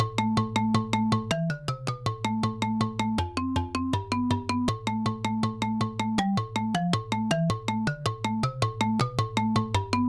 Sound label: xylo